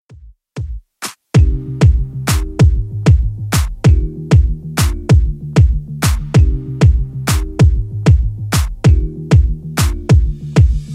96bpm

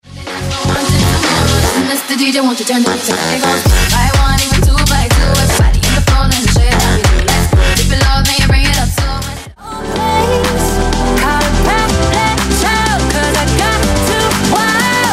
Pop Top40 House Megamix

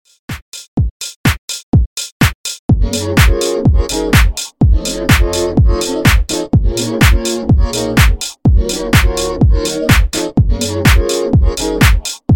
126 95 Transition

